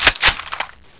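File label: famas bolt pull, weapons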